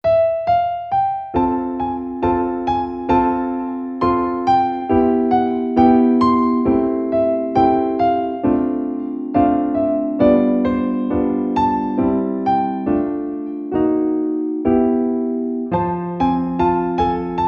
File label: Piano Version; ui chan no niiduma diary original sound track